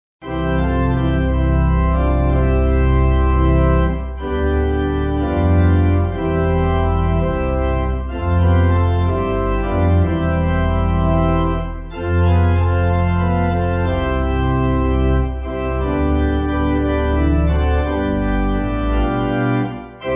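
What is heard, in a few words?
Pipe, Pipe Organ; C.H. Marsh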